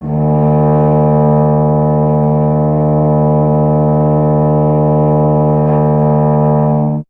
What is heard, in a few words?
BRS TUBA, Solo Instruments